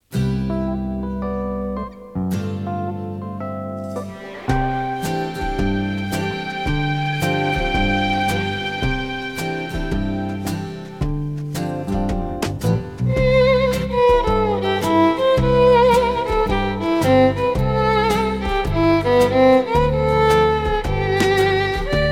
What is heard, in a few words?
instr